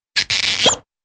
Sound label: high pitched squeak and pop